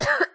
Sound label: female cough; Voice; Barotrauma; Neurotrauma